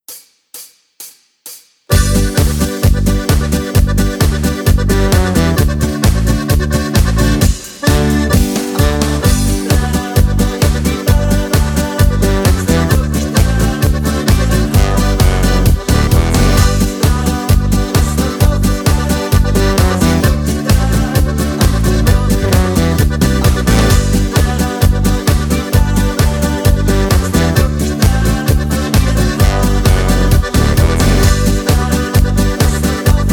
ohne Harmonika Lead mit Chor

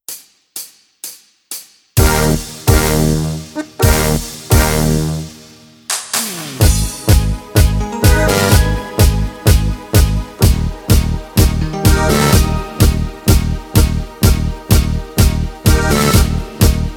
Lead ohne Chor

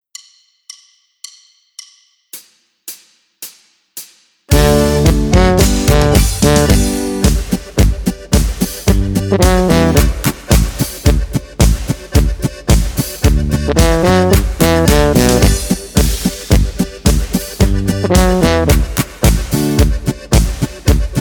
ADGC mit Begleitung

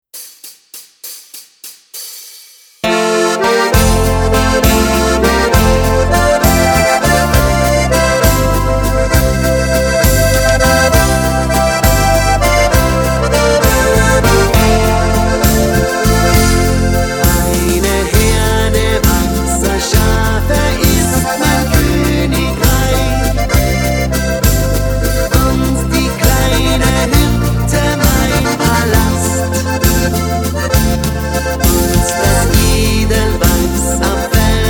Eine Herde Karaoke mit Chor

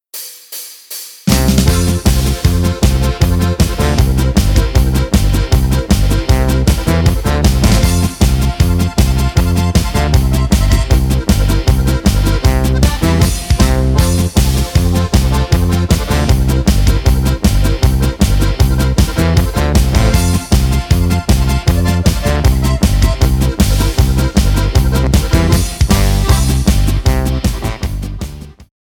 Polka GCFB

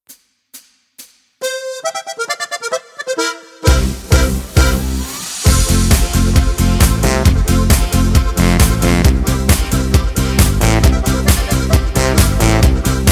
F-Dur Karaoke ohne Chor

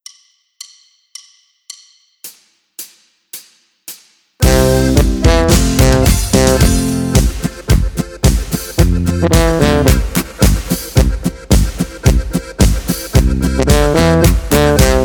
G C F B mit Begleitung

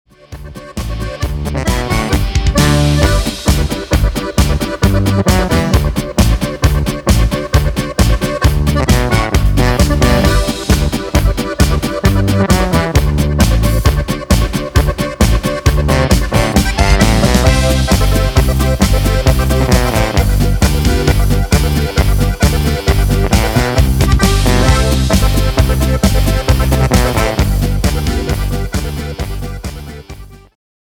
A D G C ohne Chor

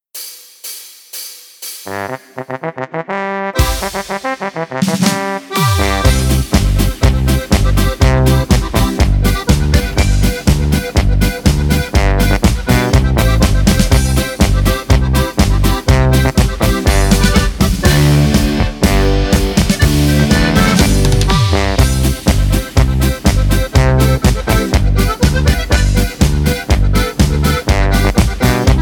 Marsch, ohne Chor

